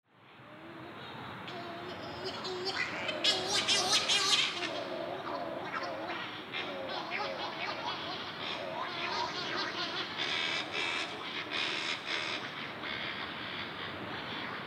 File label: storm divers